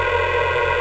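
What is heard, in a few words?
enemy fly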